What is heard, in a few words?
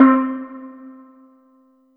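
Kawai K5000W Steel Drum C4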